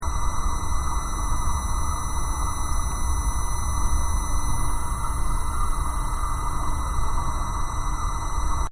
PUMP MOTOR UNDERWATER DISTANT LOOP, Fountain pump operating underwater; Machinery; Machines